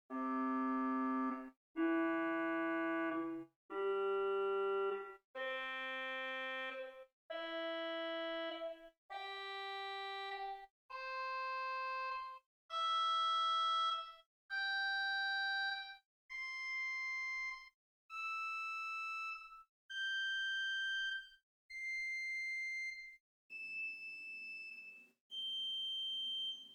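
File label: Swell Rohr Schalmei 4 arpeggio, organ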